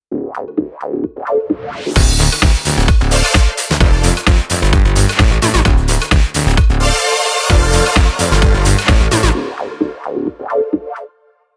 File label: Progression, phonetones